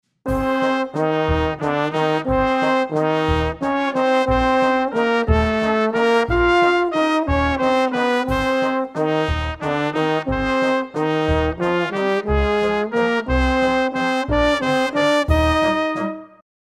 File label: trombone